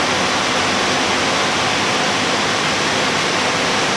Plane P40 Inflight Loop; SFX Kit; Airplanes

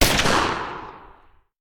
Hand Gun; Sound Ideas; Guns

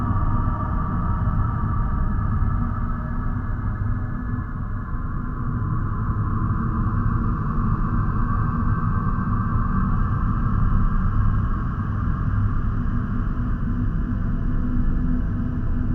Evil atmoshere; Inquisitor 3

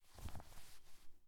body Pad; Apotheorasis

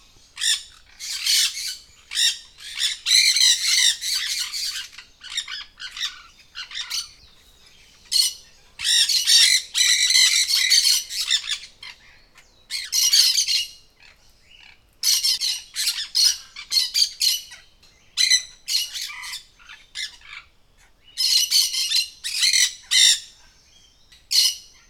birds jungle; best service Blue Box

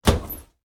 Medicine Cabinet Door Open; Doors & Drawers; 1001 Sound Effects

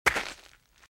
Footsteps - Gravel - Walk - Right; RadwinIsland

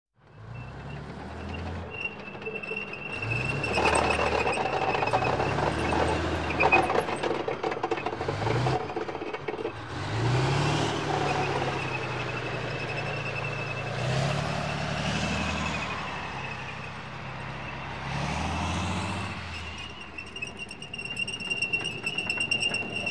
Tank Platoon Pass By; KMP Film Video Sound Effects

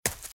Footsteps Leaves Sprint Right; RadwinIsland

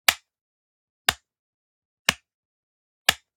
Generic Button Plastic Small

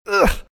hurt; RadwinIsland

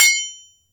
IMPACT Metal Cling Clean; mono; IMPACTS; Universal Sound FX

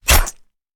Stab Bayonnet; Sound Ideas; Guns

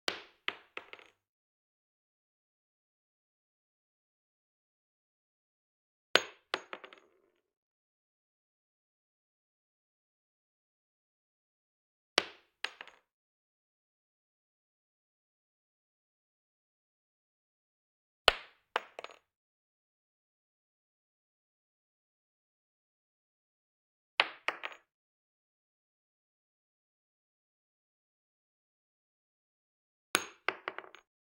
.357 Magnum Shell Drop On Wood; Hollywood Edge; Heavily Armed

